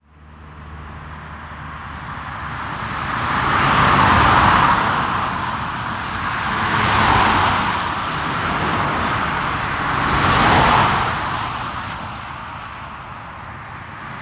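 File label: ambience urban traffic motorway medium quiet; sound effects one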